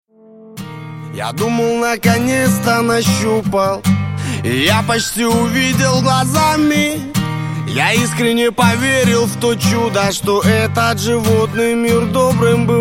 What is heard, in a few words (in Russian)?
acoustic